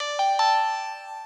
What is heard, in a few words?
Confirm